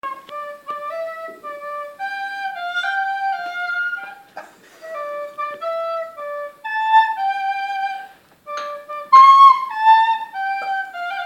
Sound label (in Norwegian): blokflote